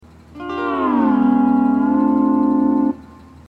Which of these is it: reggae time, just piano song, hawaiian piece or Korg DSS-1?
hawaiian piece